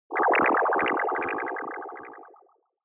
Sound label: Negative Low Long; Onoma; オノマトペ ネガティブ; 低 長